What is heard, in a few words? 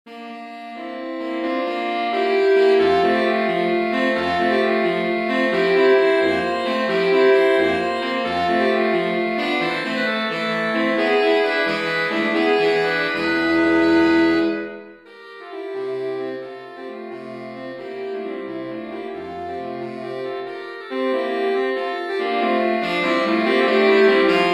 saxophone trio